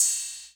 Cymbal Lite Short

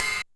Ride Hi Chop

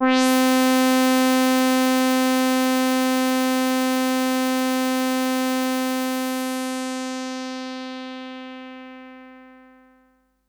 SYNTH Simple Wave

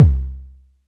Tom Low

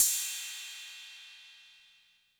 Cymbal 808 Color

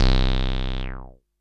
SYNTH

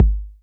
BD 808 Mid Color

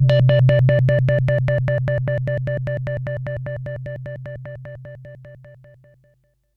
SYNTH Beauty Bell; Bellio

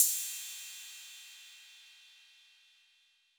Cymbal 808 Decay, Clean Digital

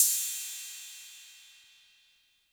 Cym B 808 Tape Decay B; Cymbal; Clean

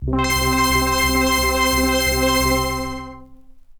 Polysix Octave Arp Vinyl Synths C3; vinyl leads

